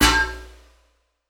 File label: empire game settlement ui upgrade cymbal impact enhance; shine